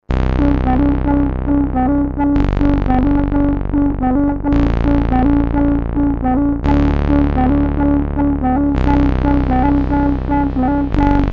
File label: stripped mono synth setup modular; analog synth; David Wood